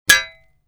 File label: Metal; TOOLKIT vol.2